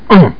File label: grunt